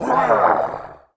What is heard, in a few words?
fall; monster; goblin leafhead